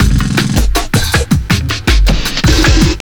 JUNGL 160, JUNGLE LOOP

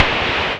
noise fx; Masterbits; Switch FX

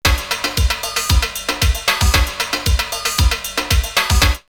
Latin Disco, drumloops, OldSchool; X-Static Goldmine

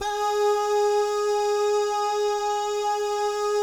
BAH GUY, Vocal Planet Jazz & FX; ILIO; BAHS GUYS

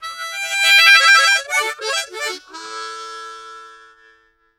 Harm 098bpm A, Harmonica

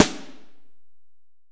ST AMB SN, STEREO AMB SNARE

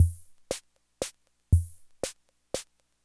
Loop, classic machines, rhythm77

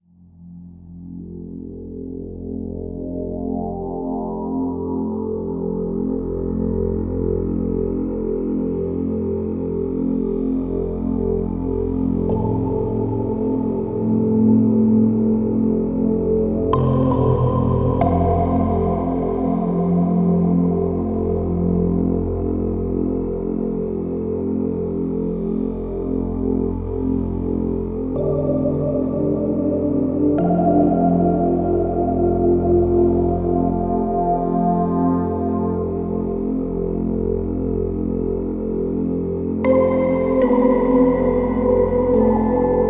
Ambient Bed; Beds; Sonic Foundry (Sony Creative Software) - Slow Silhouette

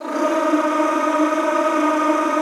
choir, D3; Best Service; Extended Classical Choir

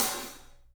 SABIAN HAT; 3D Sound; 3D Drums

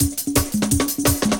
With Bongo 170, Drum&Bass Breaks; EZ Rollers

